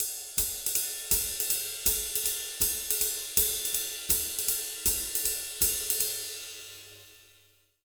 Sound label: 160 SWING, Jazz Latin Drumloops; USB Soundscan vol.08; AKAI